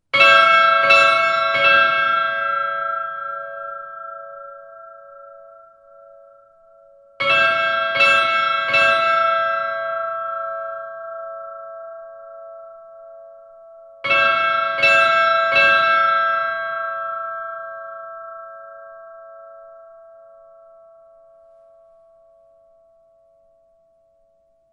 midgardakirkja badar, kirkjuklukkur

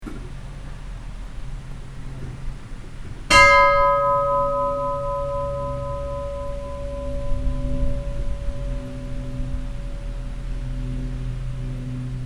logmannshlidarkirkja likhringing, kirkjuklukkur

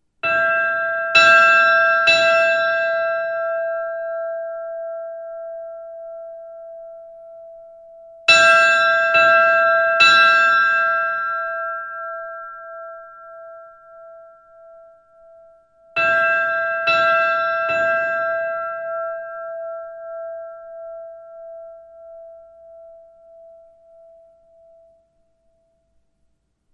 midgardakirkja minni, kirkjuklukkur

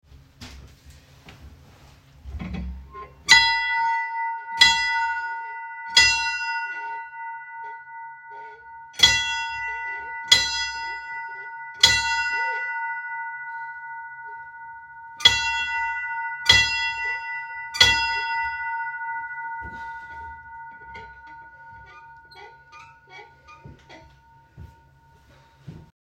brunnholskirkja 3x3, kirkjuklukkur

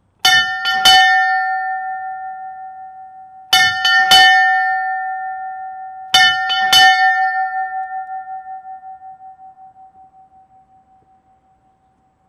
minjasafnskirkjan minni, kirkjuklukkur

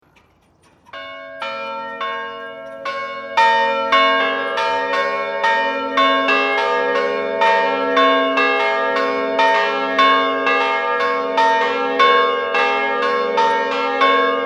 Langholtskirkja a allra vorum, kirkjuklukkur

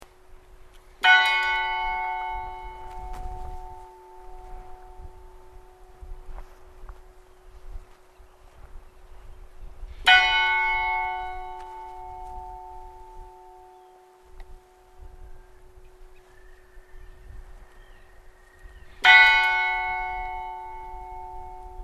hallgrimskirkja saurbae likhringing, kirkjuklukkur

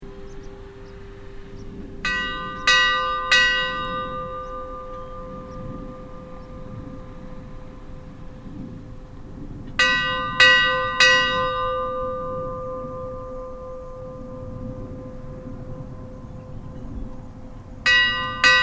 stadarfellskirkja yngri, kirkjuklukkur; vesturlandsprofastsdaemi; Yngri klukkan; Staðarfellskirkja sem nú stendur var vígð árið 1891

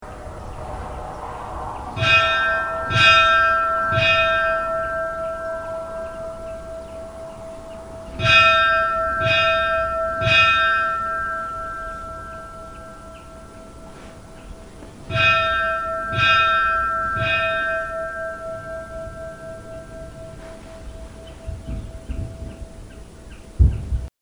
kollafjardarneskirkja 3x3, kirkjuklukkur

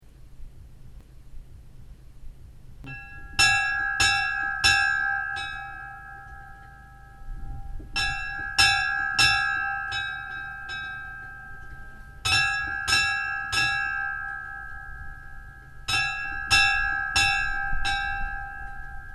garpsdalskirkja 3x3, kirkjuklukkur

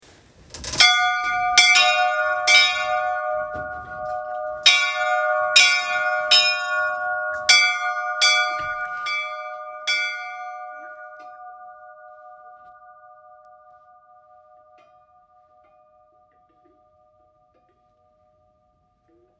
kalfafellsstadarkirkja badar, kirkjuklukkur

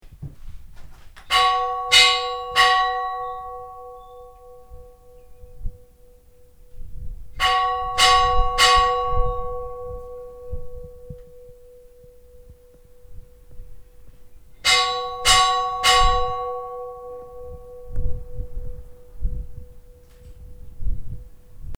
oddakirkja staersta, kirkjuklukkur

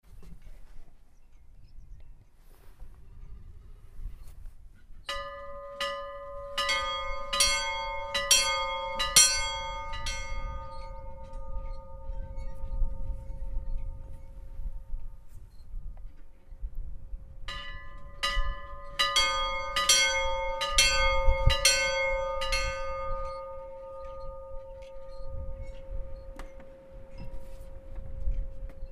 snoksdalskirkja venjuleg, kirkjuklukkur; vesturlandsprofastsdaemi

